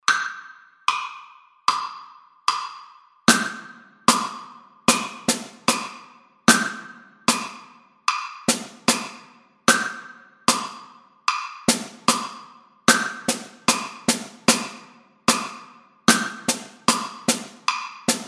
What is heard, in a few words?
drum set